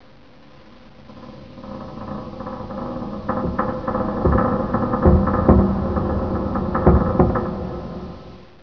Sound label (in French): bois ki crak grde piece